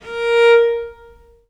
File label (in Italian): A#3, Historical Instruments, VIOLE MUT; USB Soundscan vol.23; AKAI